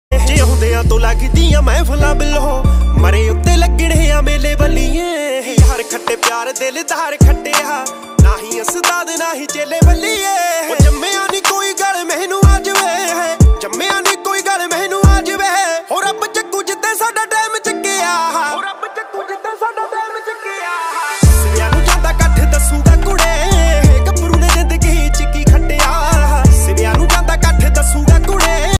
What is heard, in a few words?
Desi Punjabi Ringtone